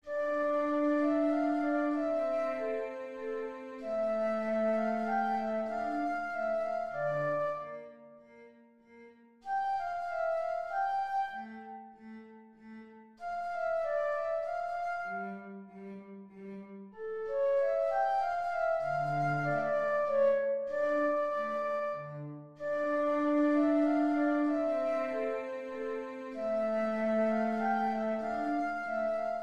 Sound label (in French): menuet en re mineur